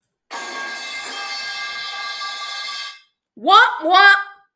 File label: womp womp; petal pipe